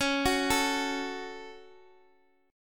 C sharp Suspended 4th Sharp 5th C sharp Guitar Standard, chord; arpeggiated; x,x,11,11,10,x